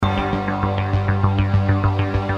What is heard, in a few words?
ringtone